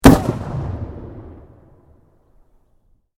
Canon Field Gun 25 Pound 3.5 Inch Breech Loader Firing Stereo, Black Powder firearms; Canon Field Gun 1880 25Pound 3.5Inch Breech Loader Firing Stereo; Black Powder firearms at a shooting range; Gun Shot Sound Effects